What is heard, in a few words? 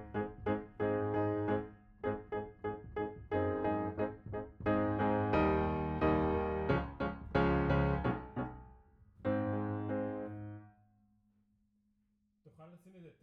Piano B